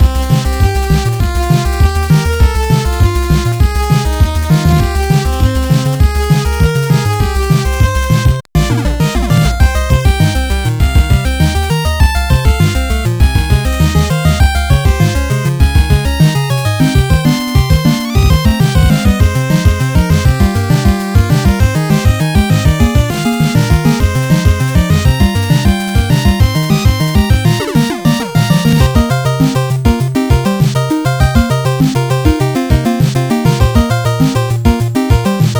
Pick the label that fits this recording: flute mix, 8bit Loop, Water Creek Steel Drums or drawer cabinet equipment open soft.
8bit Loop